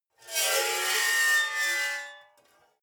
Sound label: metal scrape rich